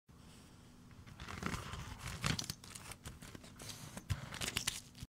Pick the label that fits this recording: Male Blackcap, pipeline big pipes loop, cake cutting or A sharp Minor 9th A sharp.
cake cutting